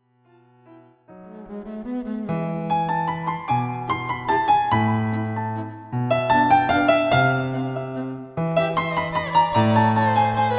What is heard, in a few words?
tango vals